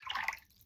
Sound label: water